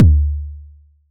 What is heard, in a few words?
Toms Medium